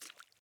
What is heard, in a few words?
water step; teardown; ps5 windows xbox series xs